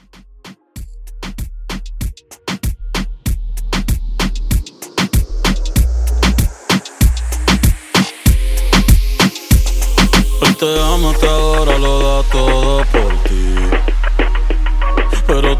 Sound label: Latin Club, 96